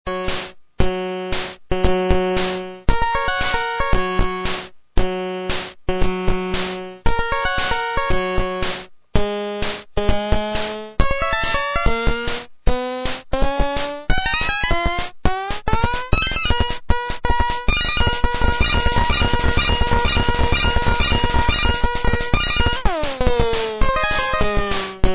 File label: guitar